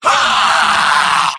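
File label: medic pain critical death; vo; tf2; mvm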